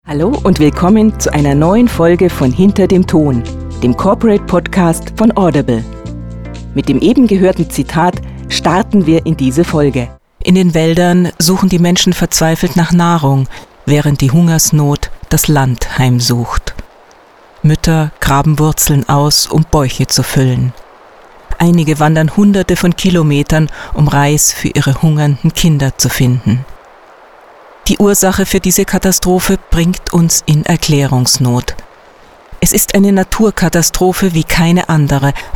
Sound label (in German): Musik